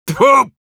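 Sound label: negative vocalization, Russian; Heavy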